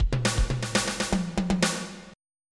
fill

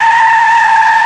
Tire, cars; Karting Race

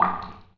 DRIP; Asterix

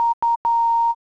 speed break loop; PC Gamer IT CD 47; USAF; USAFDEMO

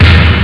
snare drum; Sound Effects for Multimedia - Volume 1

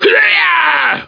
SW YELL

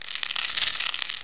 scuttle, spider; Hexen 2

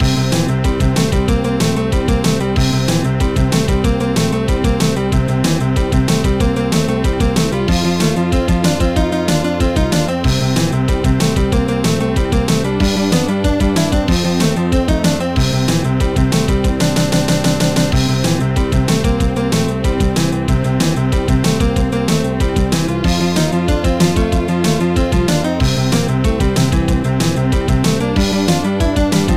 tune; World of Games; thundertronii

